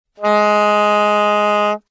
A sharp 3, soprano saxophone

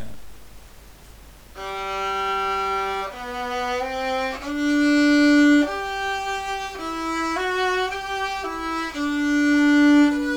Violin

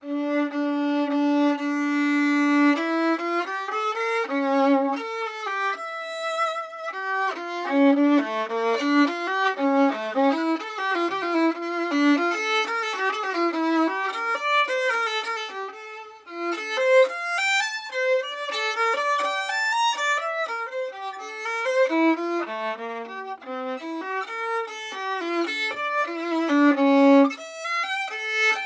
Baroque Bow Gut

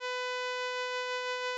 vib loudness 0.2, vibrato

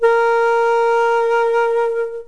A sharp 4, flute; modern

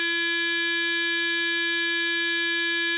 G4 normal, Clarinet

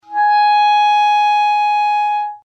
A sharp 5, clarinet

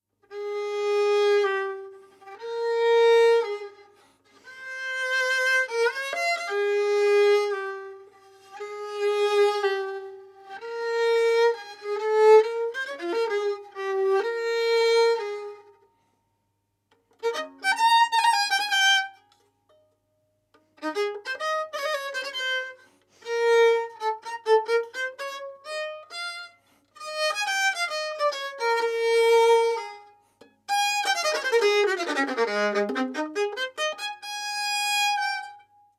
Baroque Bow

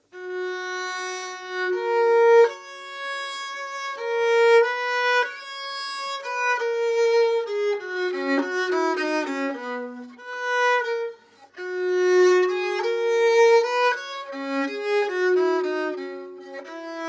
Baroque Bow; Bassano

